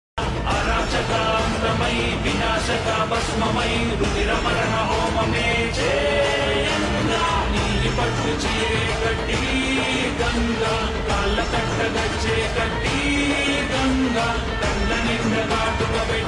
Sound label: Ringtone Slowed Reverb; telugu